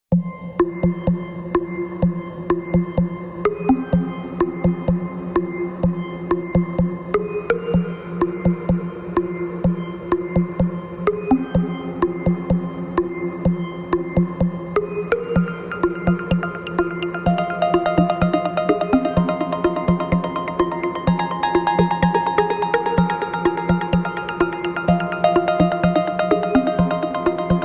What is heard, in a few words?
Autonomy Engine, Reduced